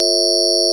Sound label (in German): netzbrum